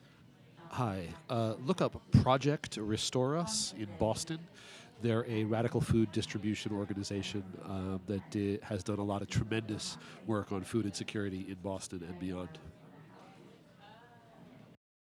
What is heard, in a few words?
Audience Recording; a-meal-premiere